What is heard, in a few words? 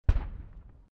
Flak Shot